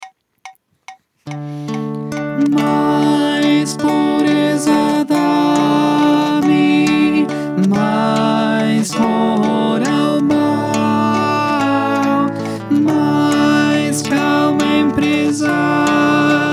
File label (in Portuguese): alto